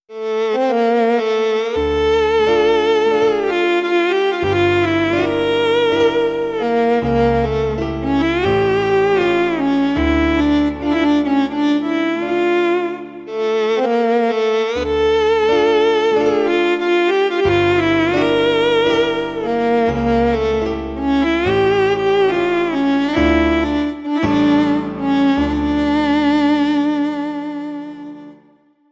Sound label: violin; Czardas